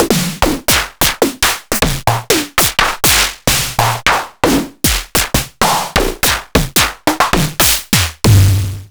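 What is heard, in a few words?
ms20 processed snares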